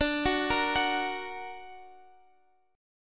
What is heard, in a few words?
D Augmented D down Guitar Half Step Down